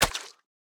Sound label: Squished; boomerang fu